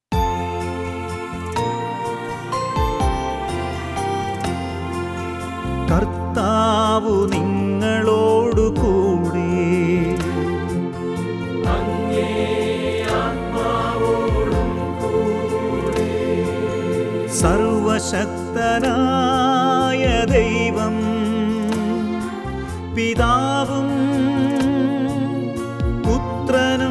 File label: Thalam Last Blessing; talam; ordo cantus